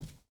footstep burlap wood run; Rust

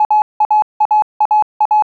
F-15 Cockpit Low Altitude Warning; DCS

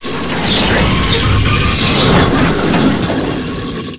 scrape, tanks

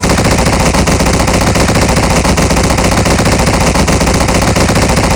AGS17 L, Weapons; DCS

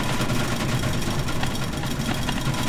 shootrattle loop

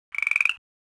rock frog; ambient; World of Tanks Blitz

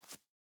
footstep hide concrete jump start; Rust

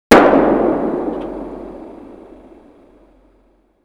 Projectile Sounds; Red Orchestra 41-45

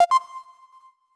task new; World of Tanks Blitz; GUI task

